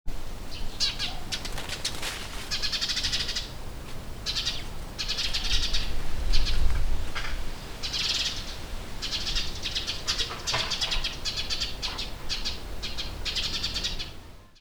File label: bird